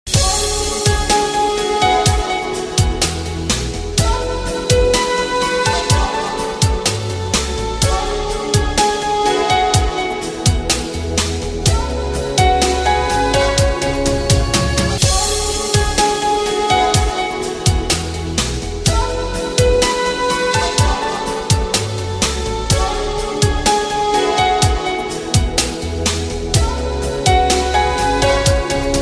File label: romanian